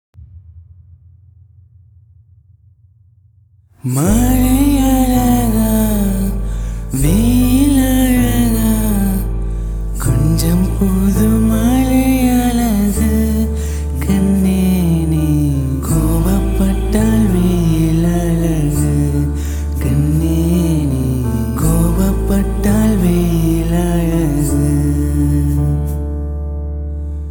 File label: Unplugged Cover